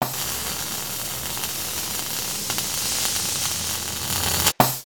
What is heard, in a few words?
welder welding slow; foley